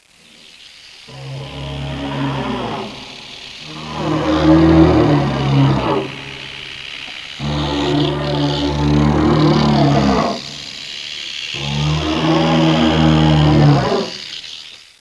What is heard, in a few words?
geneworm entry; svencoop